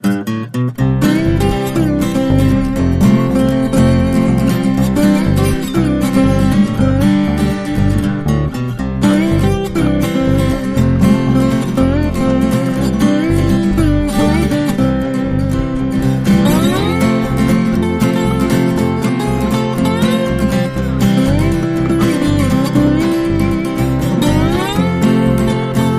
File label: Happy, No Drums